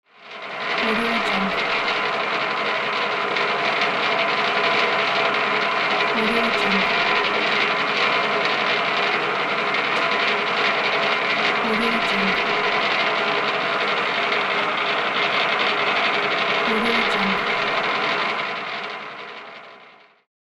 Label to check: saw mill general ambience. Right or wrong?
Right